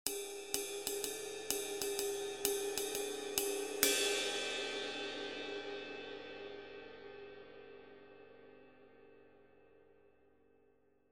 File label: 20in Ride, cymbal